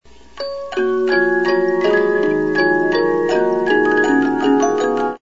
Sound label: Eine Kleine Nachtmusik Minuet, 72-Note Movements